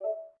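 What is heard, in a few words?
Volume Test Tone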